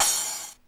CRASH; Crypton - DrumBase5000

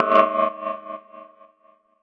Ol Chimer; Zero-G; Soundclash Nu Dance Electro; Acidized Wavs; FX Atmospheres

